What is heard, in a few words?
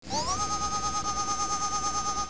Default Beep; Metroid; 250 Desktop Themes